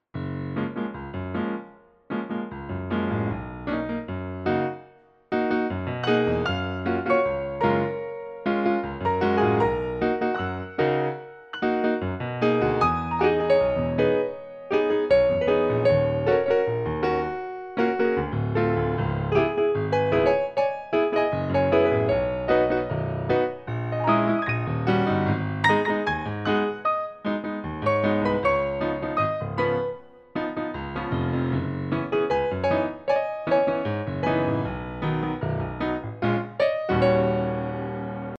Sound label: reggae